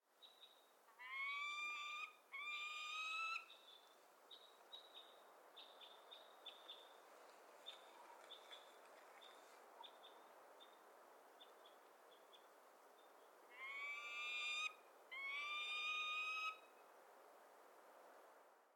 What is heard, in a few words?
Peregrine Falcon Falco peregrinus; Gairloch